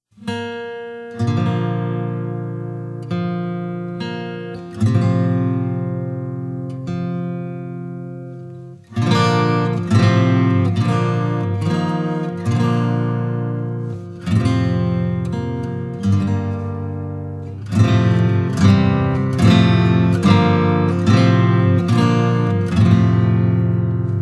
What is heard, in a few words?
O' Come All Ye Faithful Instrumental